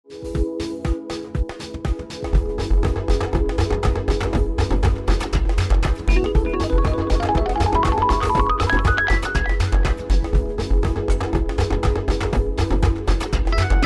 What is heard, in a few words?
contemporary urban pop house